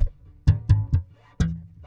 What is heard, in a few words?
Bb 120 Funk Rock